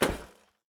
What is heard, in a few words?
walk proflist; Escape from Tarkov